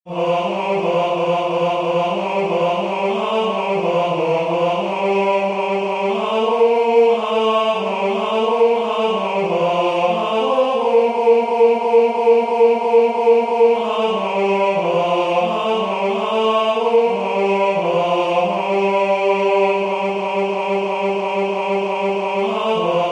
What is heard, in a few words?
have mercy on us valaam unison